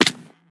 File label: pain